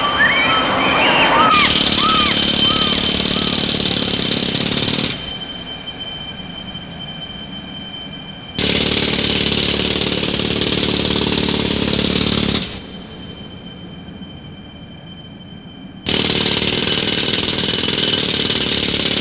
fondu-enchaine relais d energie; jeu 2 trames

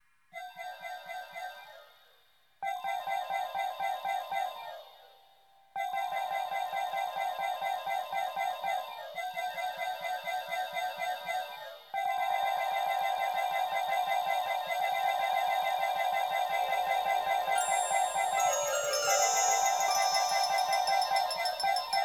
rythmes vitesses baton de pluie

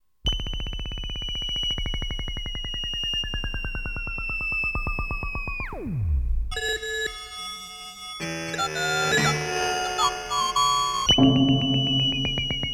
dessin complexe vibrations composees; rosace

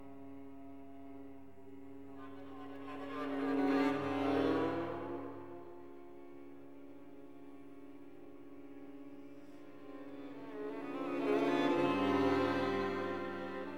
flux quatuor a cordes; R M Schafer; Waves

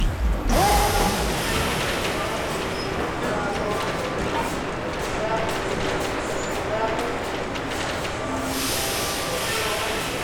tissage texture; Normandeau; Hamlet Machine

